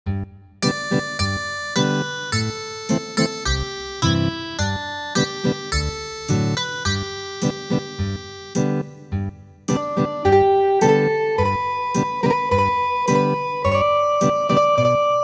Ethnic Guitar, traditional; midi

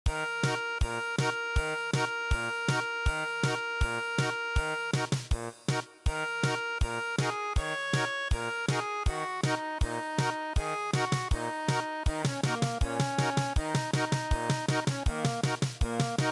German Polka; traditional

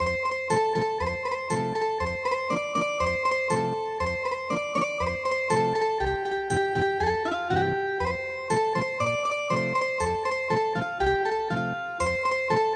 Ethnic Guitar, traditional

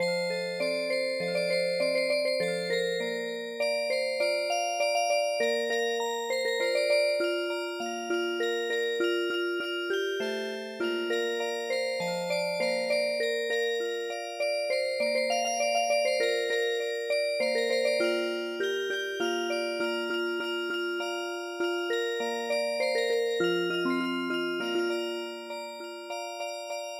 Music Box, traditional

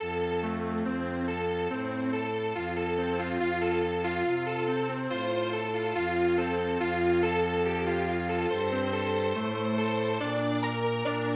strings; O Vreneli

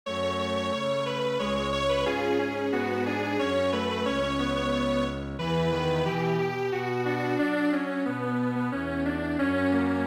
Strings Quartet

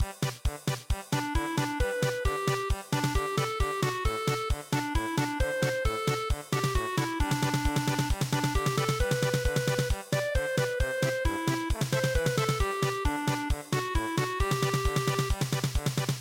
polka; natsuyama

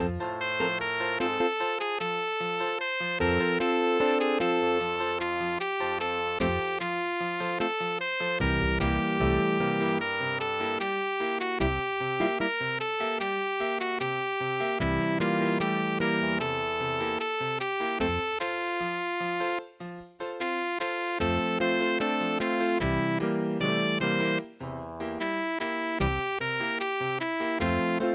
jazz waltz

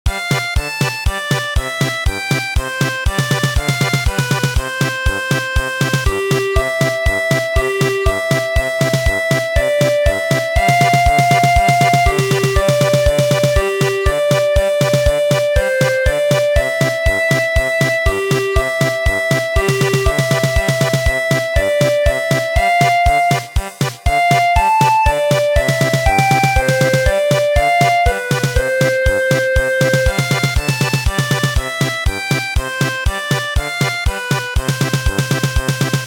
German Polka

